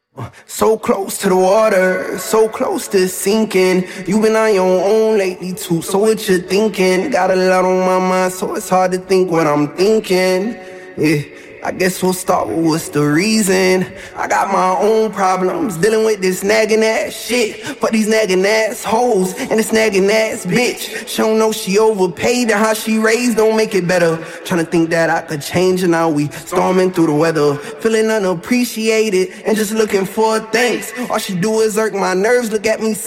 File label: vocals